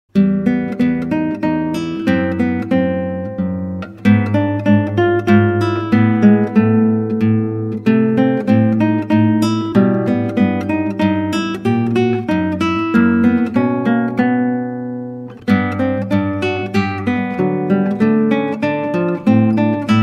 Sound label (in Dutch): Klassieke Gitaar; Bach 50 Solos